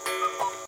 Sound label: cuckoo clock; RS Games Sounds; Monopoly